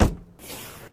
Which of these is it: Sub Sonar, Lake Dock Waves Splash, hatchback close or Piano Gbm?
hatchback close